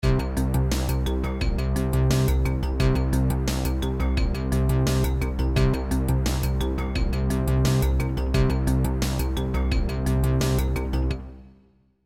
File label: Loop; Urgence